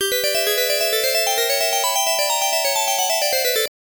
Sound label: round start music; Libble Rabble; X68000